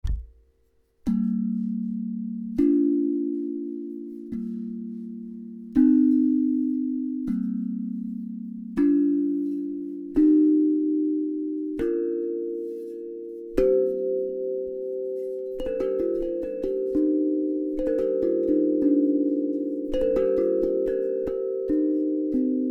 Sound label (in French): G heptatonique 432